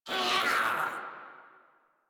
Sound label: Infested Runner Attack Call; Warframe; Runners